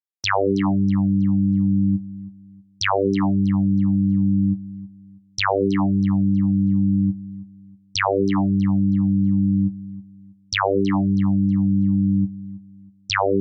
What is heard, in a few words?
Ringtone Electronica; Melodata Productions